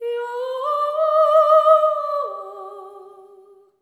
SERENE, Symphony of Voices; Spectrasonics

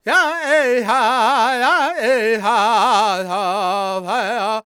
NA G# MIN, Vocal Planet World Voices LAKOTA; ILIO; Vocal Planet VOL-5

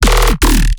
bass hit Growl Blast D#; Virtual Riot

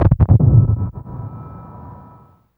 THUNDER HIT, Impacts, Hits & Booms; Distored Reality

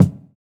Kick, jungle breaks drum sounds

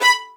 XLD2 B5, LOUD VIOLINS; Q Up Arts; Denny Jaeger Master Violin Library

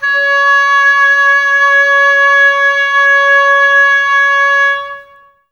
OBOES C#, Oboes, Wood Winds; Miroslav Vitous; Woodwind Brass Ensemble

